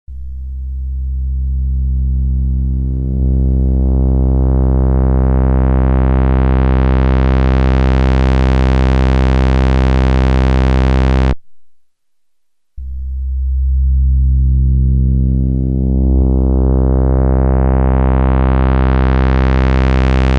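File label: CHARACTER SWEEP MEAN; Redshift 6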